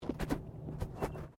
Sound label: Body movement Foot Foley; Body movement foley to simulate falls and deaths; Footsteps; Human